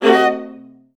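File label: violas D; Best Service; Peter Siedlaczek's Advanced Orchestra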